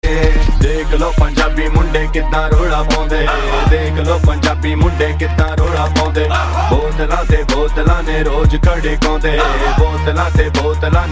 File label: Punjabi